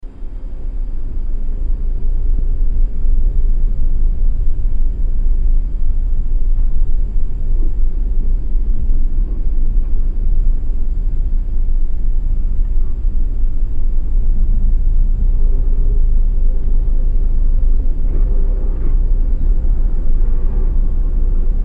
The End Lights Off Ambience; escape the backrooms

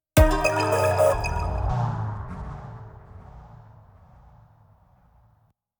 Juice negative; mokoko x

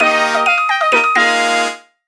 Jingle Game Start; Flowoooot

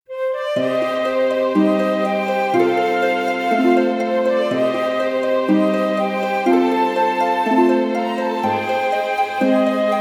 Pure Melody; Hexyz Force

